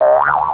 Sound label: ui camera shutter, Motorola ROKR EM35; ui sounds